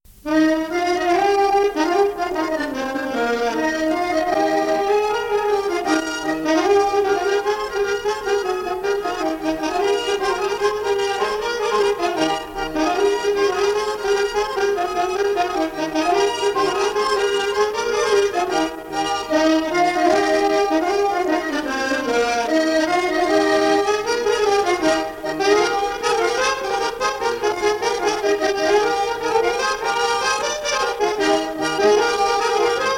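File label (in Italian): ballo (accordion); cuneo; ballo (fisa)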